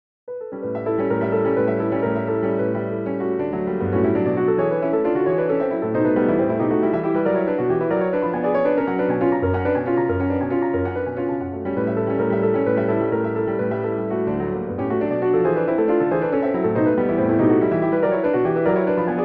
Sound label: Prelude